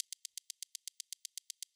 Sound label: reels rotate loop; Butterfly Lovers; wazdan